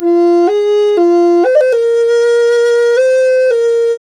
F 120, FLU RIFFS, Didgeridoo and Other Primitive Instruments; Big Fish Audio - Didgeridoo and Other Primitive Instruments